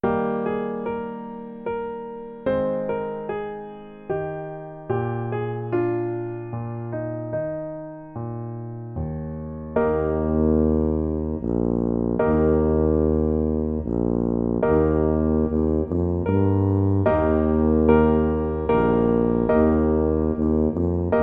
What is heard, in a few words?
Tuba Melody; Royal Fireworks Tuba; Tuba Solo